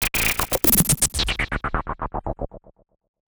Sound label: Gated Noise 120BPM; musicradar